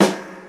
snare single hit g key, acoustic snare sound tuned to the G note; snare drum samples; single hits one shots